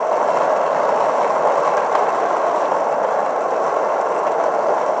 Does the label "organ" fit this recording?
No